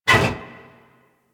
Frame Movement; DE; Warframe; Vay Hek

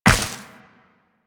Step Ice, Footsteps Walk; DE; Warframe; Tenno

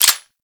Fire Stop Mech C; Velox Prime; Protea SMG

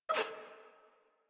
Pain, Adult; Warframe; New War; Adult Operator

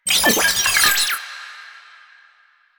Sniper Drone Vocal; Enemies; Drones; Warframe; Lasrian

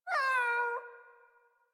Kitten Meow Playful; Enemies; Warframe; Catbrow

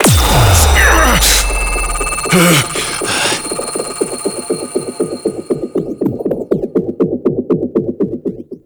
Stinger; Warframe; Nineteen Ninety Nine; Quests; Mall Outro

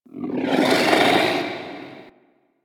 Interior Moan Gurgle; Warframe; Infested Eris; Misc Indoor

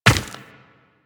Run Rock, Footsteps; DE; Warframe; Tenno

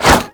Whoosh H; Warframe; Weapons; Infested; Coda Hirudo

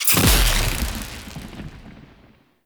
Shock Mote Strike

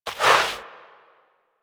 Crouch Move Foley; Warframe; Tenno

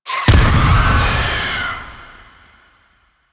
Infested Drop Pod Impact; Warframe

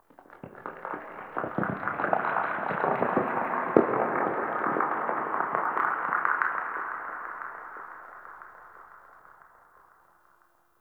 Rail Jack Exterior Earth Crackling; Ambience; Earth Region

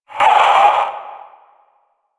Possessed Red Veil Vocal Pain; Enemies; Warframe; Priest Quest

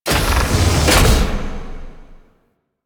Apartment Door Close; Ambience; Warframe; The New War; Quests; Zariman

